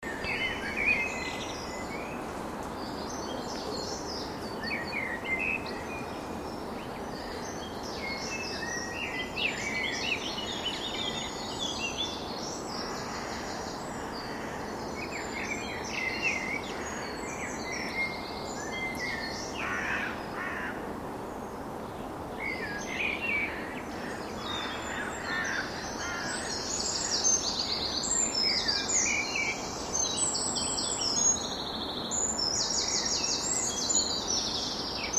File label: Chiswick dawn chorus